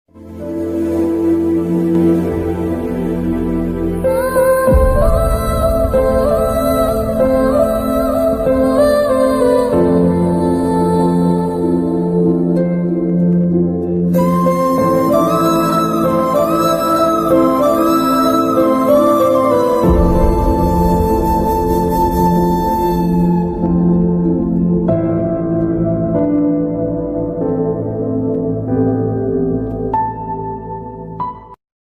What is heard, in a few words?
heart touching bgm; mr majnu movie; south movie